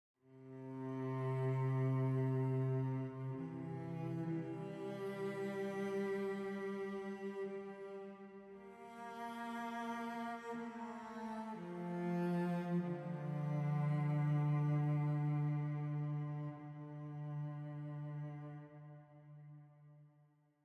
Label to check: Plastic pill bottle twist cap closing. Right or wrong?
Wrong